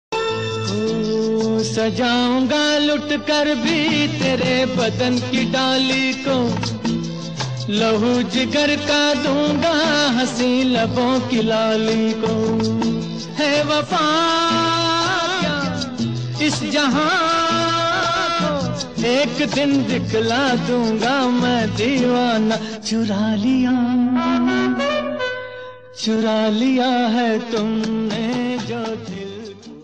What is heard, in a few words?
Old Ringtone